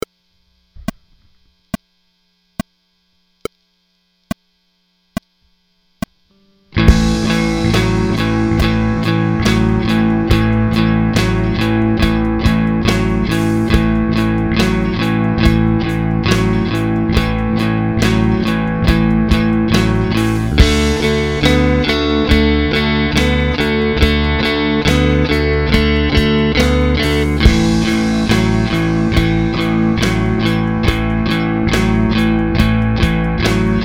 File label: 12 bar blues rhythm in a